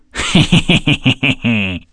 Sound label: La La